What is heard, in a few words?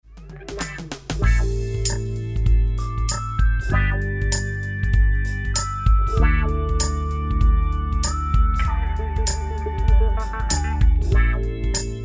mix wah wah; eterna